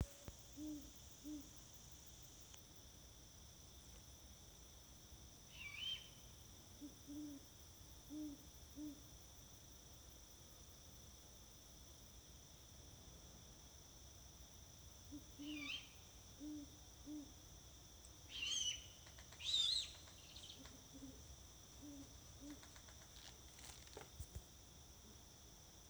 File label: great horned owl and mystery bird call